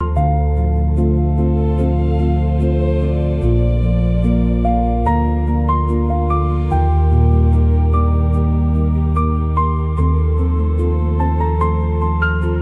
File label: loop